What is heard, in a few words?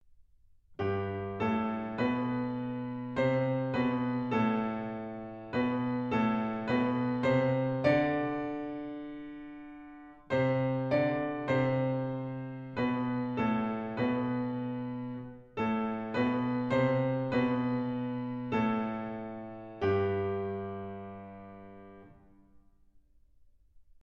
Unison Melody, Piano; Book 1; Mikrokosmos, Sz. 107; Bartók; Complete Solo Piano Works